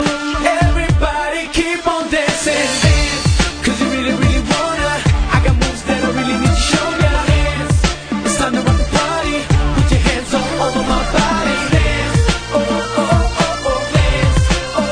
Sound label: Dance Song